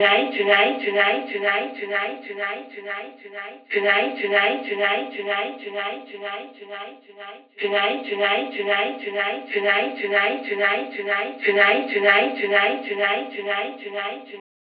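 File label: vocal tonight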